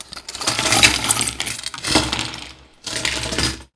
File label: CLATTER; Rarefaction; Poke in The Ear With A Sharp Stick